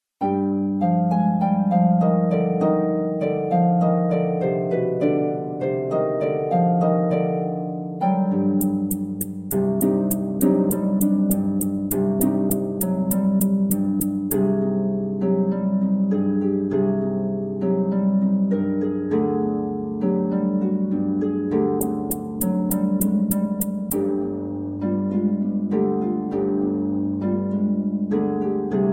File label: Rumba